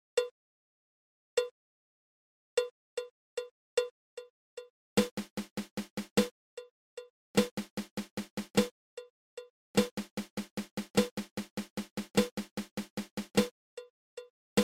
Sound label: Flam Paradiddle diddle